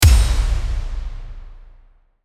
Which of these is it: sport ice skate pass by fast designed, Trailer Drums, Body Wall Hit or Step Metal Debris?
Trailer Drums